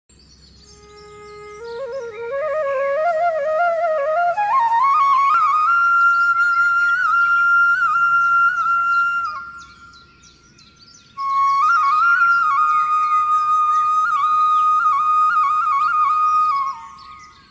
morning flute music himalayan flute music mountain flute meditation music